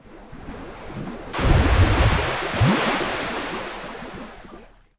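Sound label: series of bursts pulsating stream, geyser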